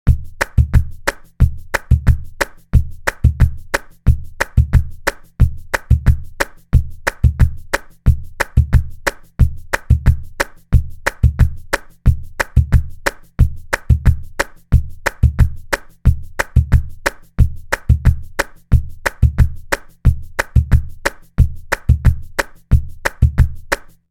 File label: Rumba, flamenco guitar